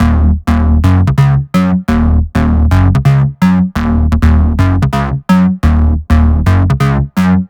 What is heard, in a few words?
Melody Kits 128BPM Root D#; Vengeance Samples